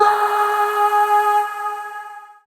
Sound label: Void Shawzin Oct Two G Sharp; Warframe; Zariman